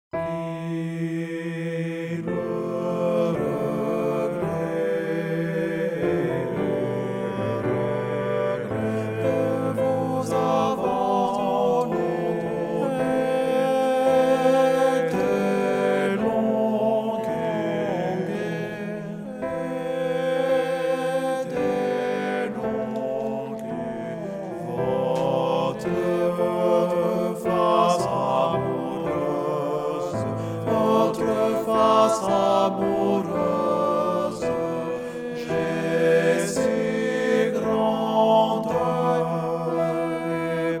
MILLE REGRETS TUTTI

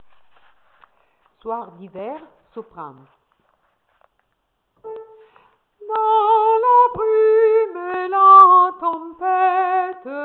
soir d hiver sopran

